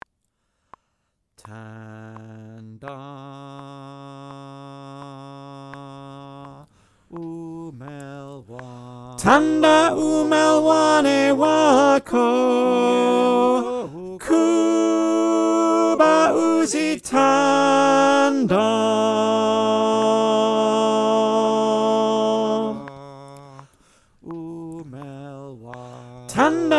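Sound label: tenor, choir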